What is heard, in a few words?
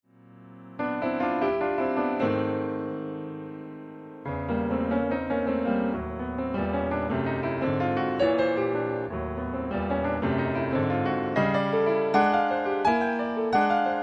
Preludio (solo piano); Classica; Damerini; Doubleface